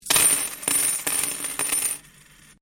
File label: Coin Penny Multiple Drop Laminate; Common coins; Money; Objects